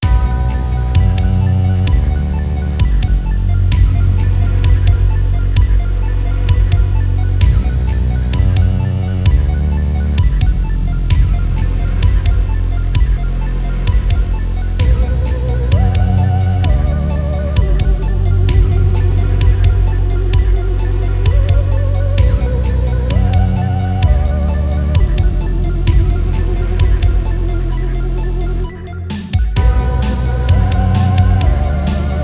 Level Creepy

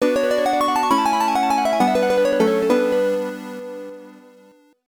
Harp; Brandish VT; hoot; PC-98

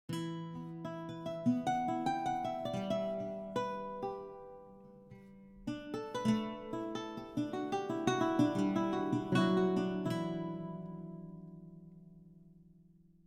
baroque guitar; gamerip

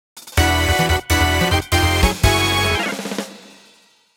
Final Lap Fanfare - One Minute Remining Fanfare; Final Lap Fanfare (Race) - One Minute Remining Fanfare (Battle)

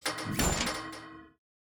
Blocker Close; Zuma Blocker Close

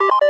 SFX Button Play

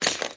Drink start

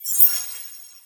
Pick Bonus; All Star Fruit Racing

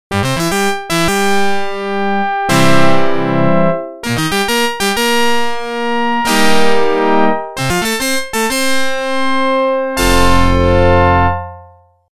Organ

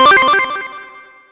achievement unlocked

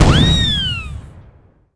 cannon shoot; transition cannon shoot

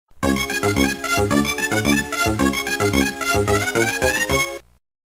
Pacman Arrangement - Game Start; Pac-Man Collection; GBA

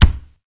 Football paddle ball hit

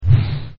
Whoosh

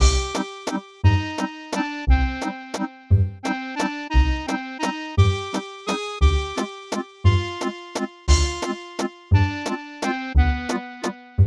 Waltz; timmy turners globe run 2023

